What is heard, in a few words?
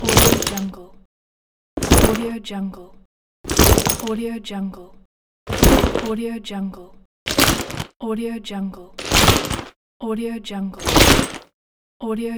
medieval soldier steps pack